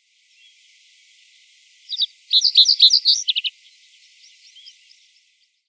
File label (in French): bruant des roseaux